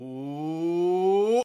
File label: "woo" male up, voice; ooh ahh; ho hoo woo